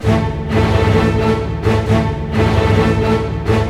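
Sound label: 136 war, orchestra; East West; Scoring Tools; War Status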